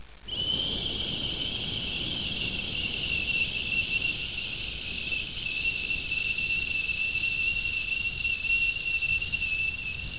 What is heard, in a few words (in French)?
acou, acouphene